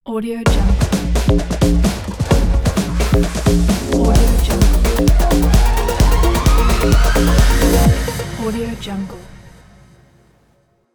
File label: tv show intro logo; smartsounds